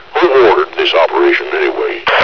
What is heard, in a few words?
death; Alien Arena; marine